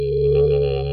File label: bass; ADJMarc